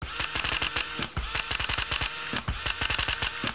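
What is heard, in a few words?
calculator; 12000 Graphics Pack - A Complete Graphics Collection For Your PC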